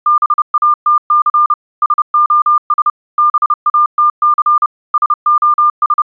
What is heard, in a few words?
CW battery LOW